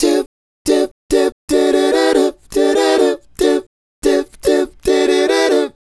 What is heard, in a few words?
Male Group, Vocal